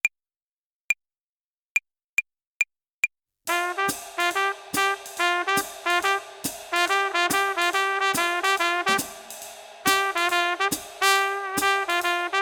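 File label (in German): Swing Phrasierung Übung; play along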